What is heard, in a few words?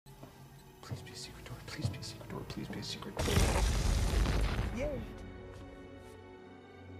Secret door